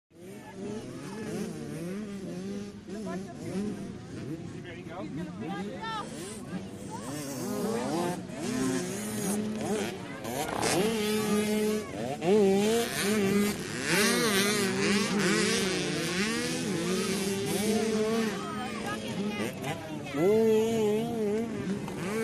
Motorcycle Motocross; Pit Ambience 50 Cc Pit Ambience 50 Cc, Crowds, Bikes Idling, Revving, M / S Fast Bys From The Track, Shot Cu From The Pits.